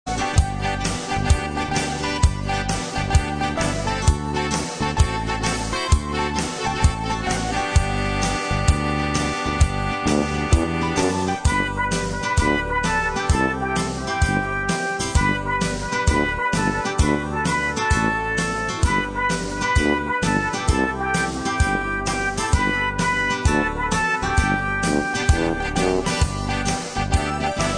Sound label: Instrumental